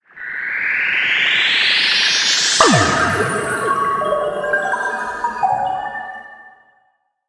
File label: Light Bubbles; Fortune Street; wii